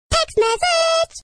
Text message

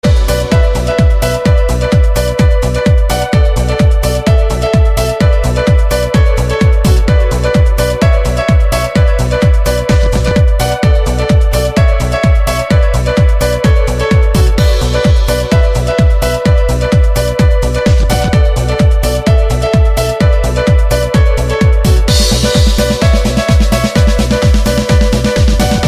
piano; ecuador

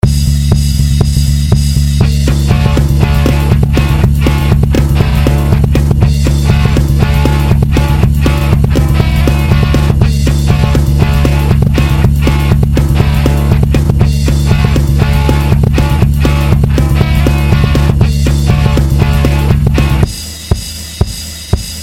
Punk Rock Tone

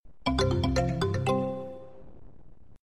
Item Found Rare; MH

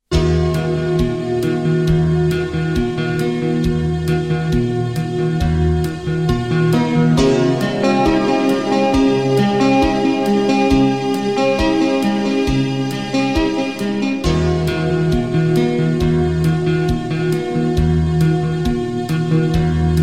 Instrumental

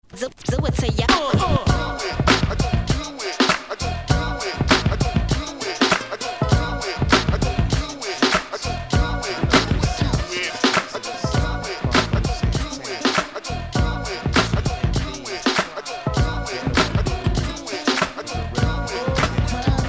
Dance Mixes

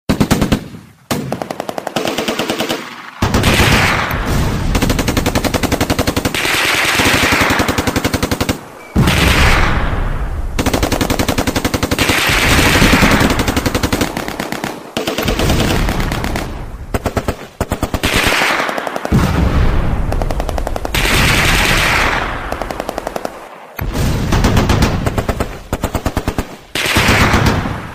Machine Gun Fire

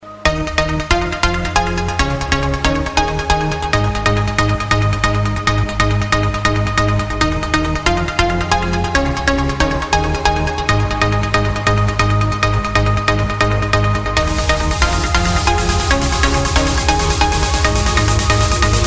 New Trance Sound

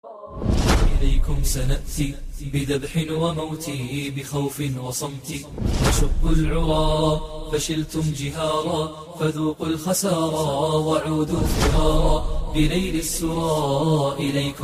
Islamic Prayer; USA

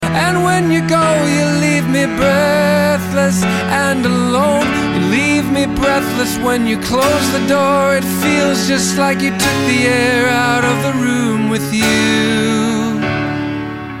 Breath

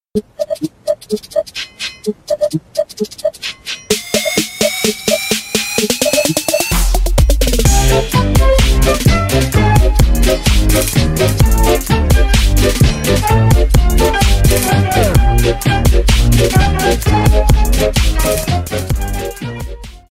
Trap Engine; Thomas

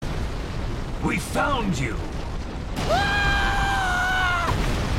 Scream; Escanor

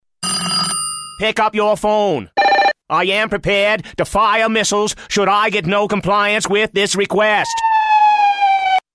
Fire Missile

